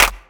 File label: Clap; Equipped Music; Loungin House